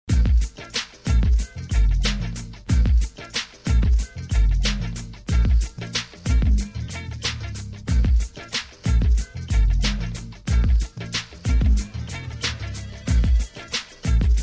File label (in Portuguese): rap